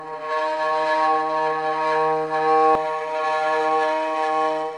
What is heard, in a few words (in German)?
violine